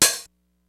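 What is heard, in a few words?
909 House; DRUMS + PERC; OTHER DRUMS; Rubber Chicken Software Sample Bank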